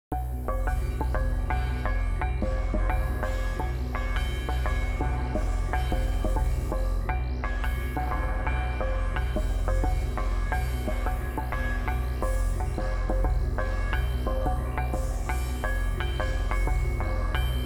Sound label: Suspense Bells